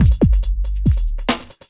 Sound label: Beat, Drums, Eclectic Electronica